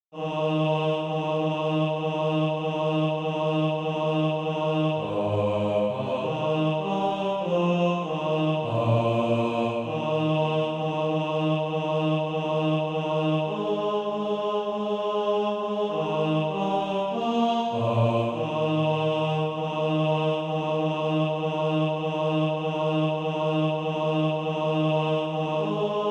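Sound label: bass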